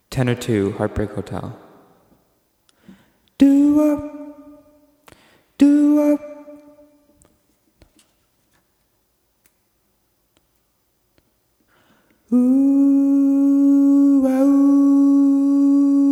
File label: tenor two